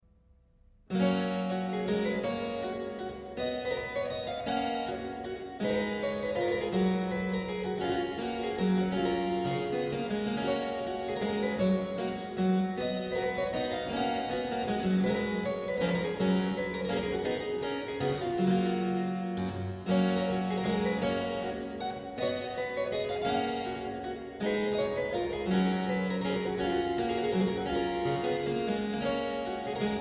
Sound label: menuet g dur; bwv anh 114; clavier buechlein fuer anna magdalena bach 1725